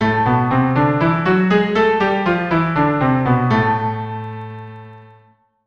A melodic scale, keyboard

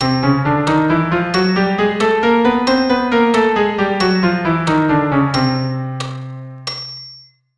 Chromatic scale, keyboard

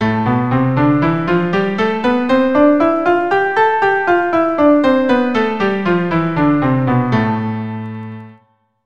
Am Natural, keyboard

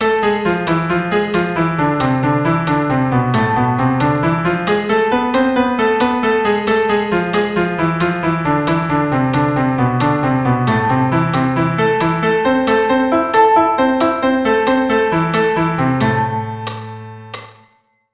Am harmonic

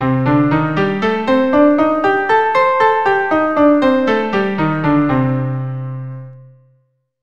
Pent, keyboard

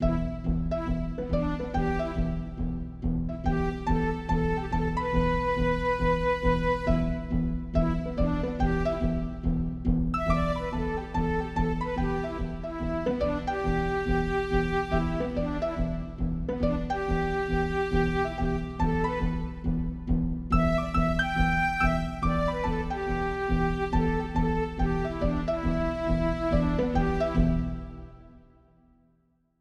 pent

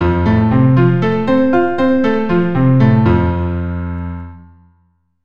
F Arp, keyboard

